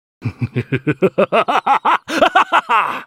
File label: laugh; Vladimir; Character Voices - English; en US